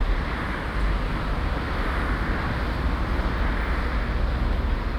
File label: Traffic City